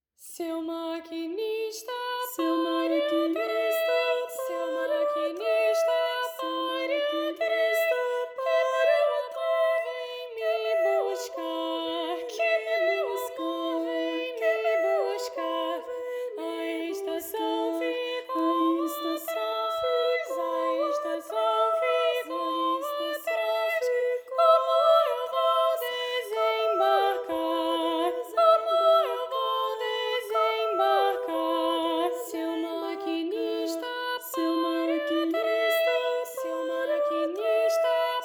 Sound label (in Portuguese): Canon a duas vozes; O Pequeno Cancioneiro